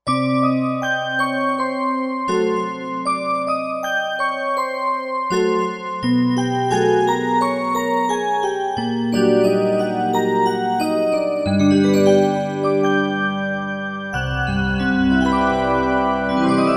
Bells, Korg Wavestation A-D

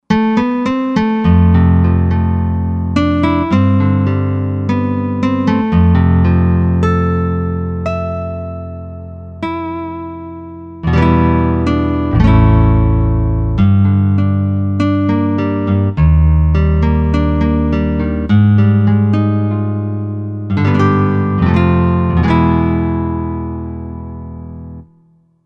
Nylon Guitar, Korg Wavestation A-D

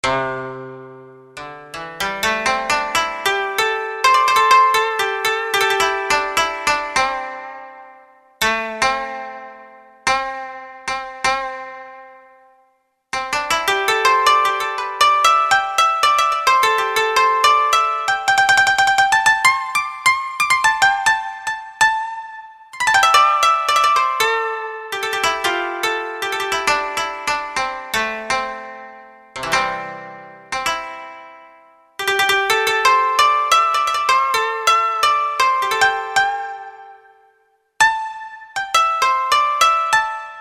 Koto, Kurzweil K2000; Kurzweil K2000 Contemporary ROM

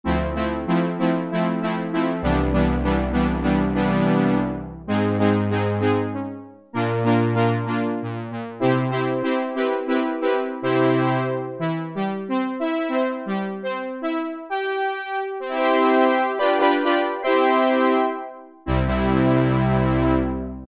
TRUMPET ENSEMBLE, Oberheim OB-8; Factory Patches

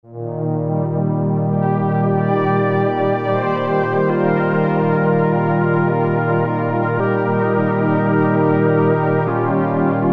Strings; Cheetah MS6

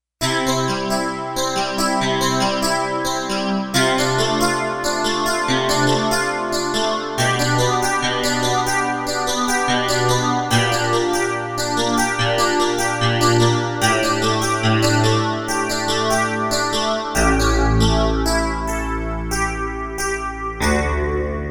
Stratocord

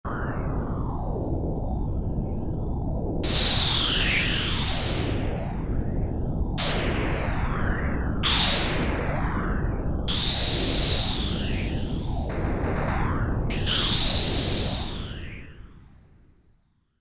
Stormy, Korg MSC-12S Synth; Synth 3

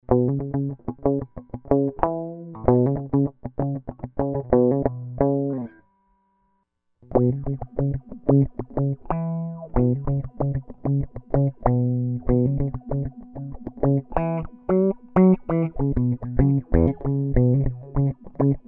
Electro-Harmonix Stereo PolyPhase

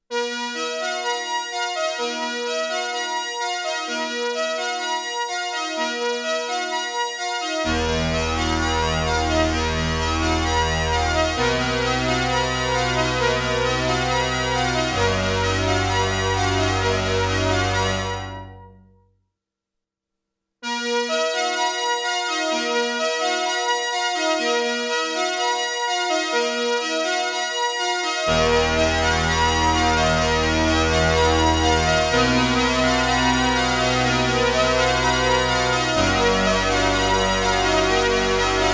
Double Tracking

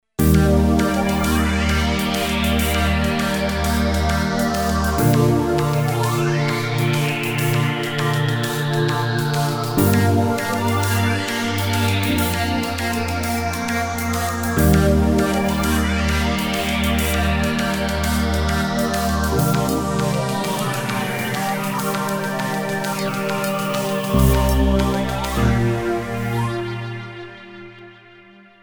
Shh Im Sweeping

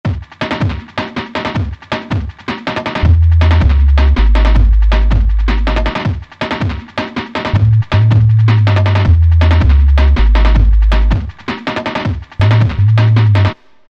Dirty Jungle; Roland SR-JV80-11 Techno Collection

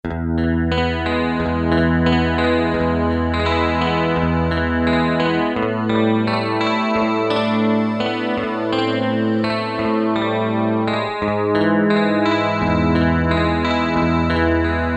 Modern Gtr; E-mu Proteus-1; Presets

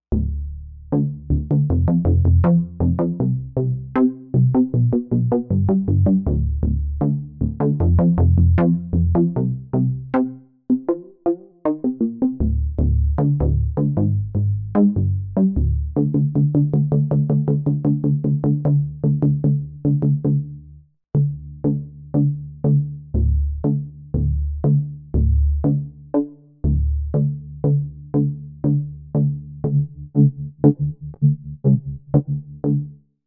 EX BASS

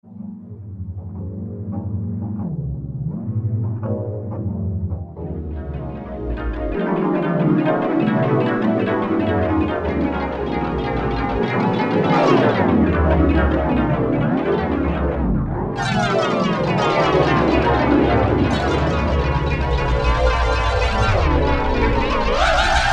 SE Vektr, Yamaha TG500

